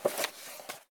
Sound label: Book pick up; The 39 Steps